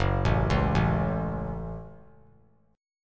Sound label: Gb Minor Gb; arpeggiated; JGuitar